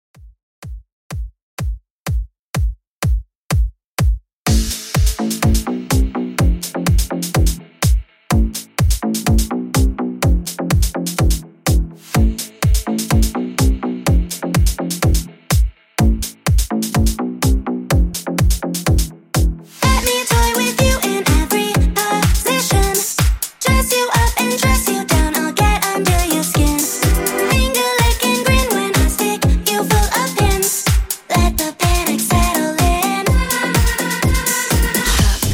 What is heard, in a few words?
Dance House 125Bpm